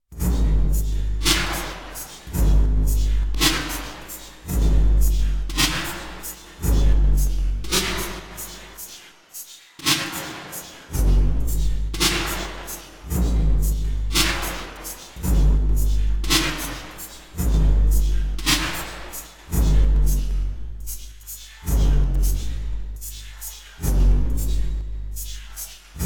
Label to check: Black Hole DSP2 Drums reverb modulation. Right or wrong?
Right